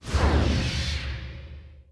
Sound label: keep, ambience